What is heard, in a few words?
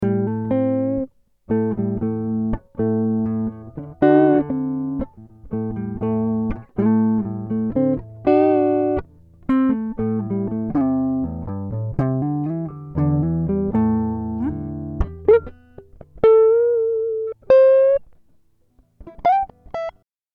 Am60 GTR impro